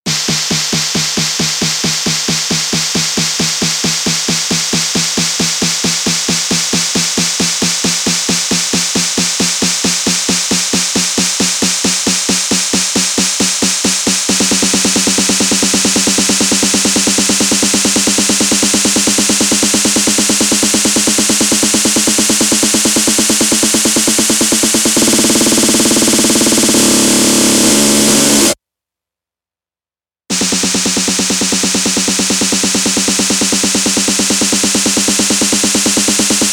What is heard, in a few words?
Snare Rolls